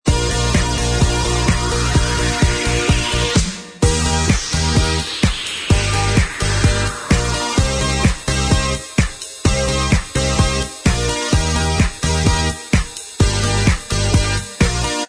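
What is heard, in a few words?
instrumental, track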